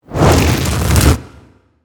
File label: Fire bolt